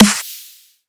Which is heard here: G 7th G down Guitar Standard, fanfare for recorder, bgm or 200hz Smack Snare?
200hz Smack Snare